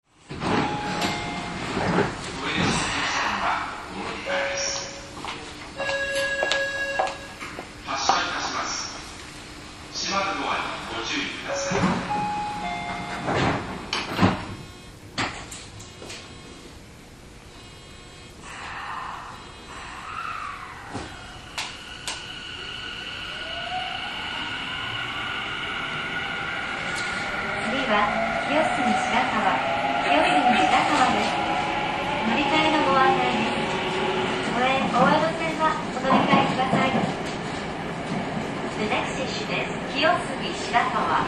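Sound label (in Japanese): 走行音, 50050系; Tobu; 住吉→清澄白河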